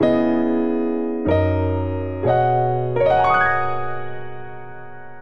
Progression Glissando ii V I B Major 92 bpm, Grand Piano; MIDI Clips; Ableton; Factory Packs